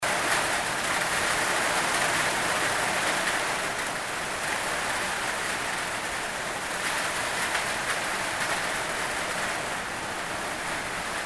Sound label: rain